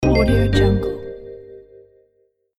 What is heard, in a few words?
Level Fail